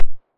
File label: silencer mono far, weapons